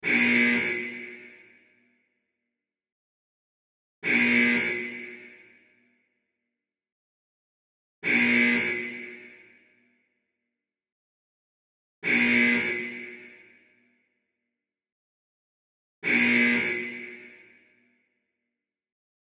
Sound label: alarm